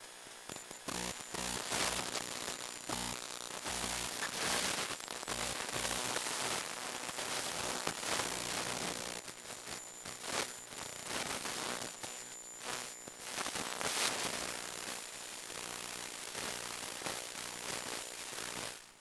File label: Electromagnetic Macbook Sequence; Mono; Essentials Series NOX SOUND